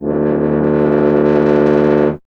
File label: BRS TUBA, Solo Instruments